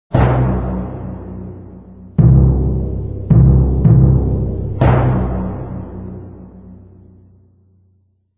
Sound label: timpani